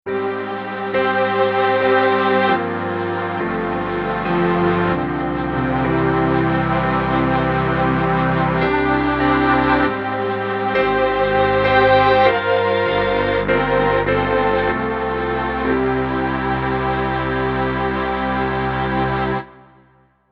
Layers, Mellotrons; PC3; Warszawa

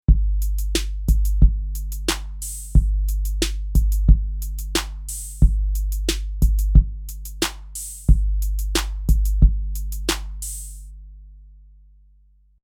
Drums; PC3; Hello Brooklyn

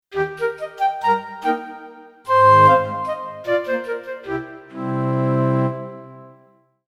Solo Flute; PC3

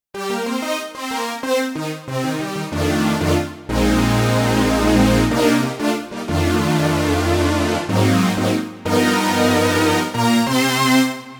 ARP2500 Brass; SP4; Synth

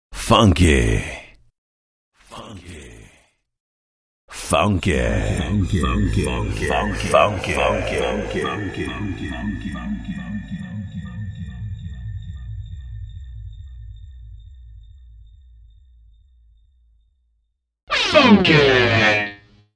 Funky

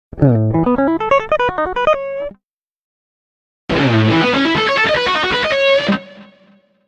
Elec Gtr Arp Lead

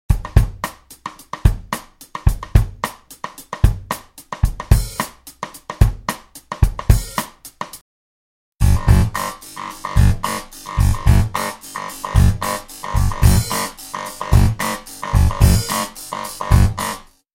Drum loop

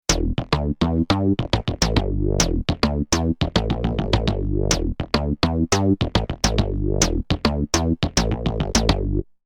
Perc Morph Bass

